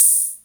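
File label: CR78 cymbal; E-MU Formula 4000 Series Vol. 2; OrbitPercMaster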